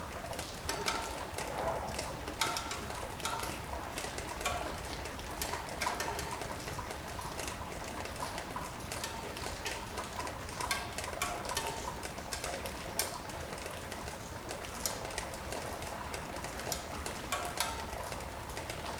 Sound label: Water Dripping on Metal; SFX; IRL Recorded